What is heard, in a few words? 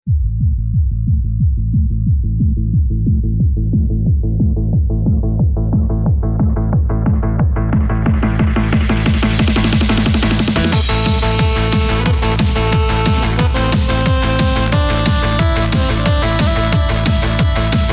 volcanic depths; freepixel; Biomes